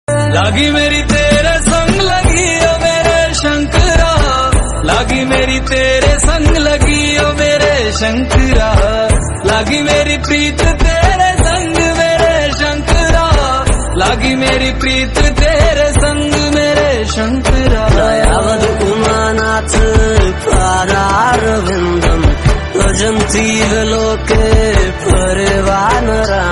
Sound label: Hindi